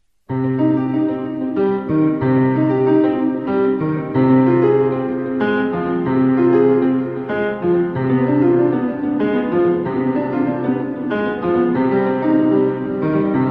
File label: Prelude C minor; Bach